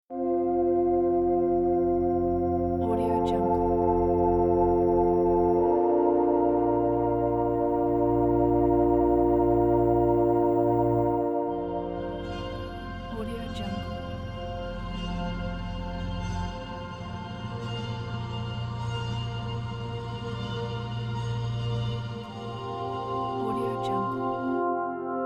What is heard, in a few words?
beautiful calm background